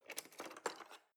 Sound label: knife wood drawer handle scrape